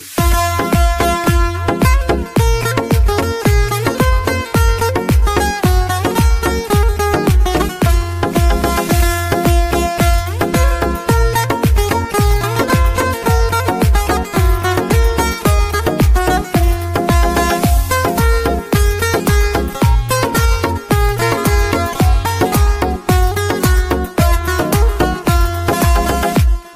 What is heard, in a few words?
music